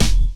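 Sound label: snare drum bass drum, Dance Mega Drums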